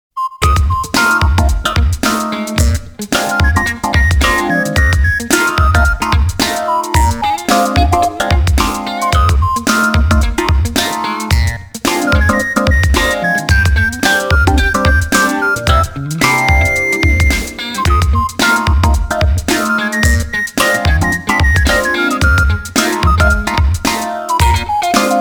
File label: Stumbling Trio